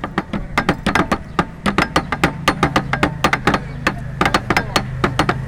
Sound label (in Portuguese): Batida Caixas